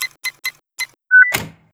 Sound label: respack open; svencoop; frostline